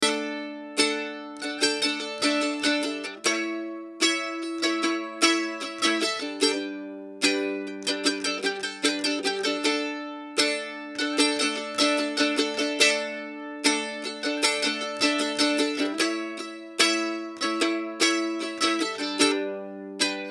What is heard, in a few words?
Mandolin; admiralbob77